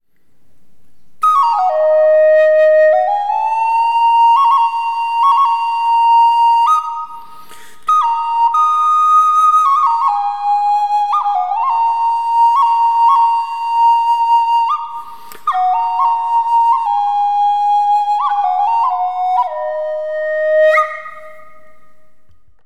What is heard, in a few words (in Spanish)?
Anillo reverb